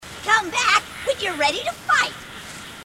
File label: grunt; h3dialogue; grunts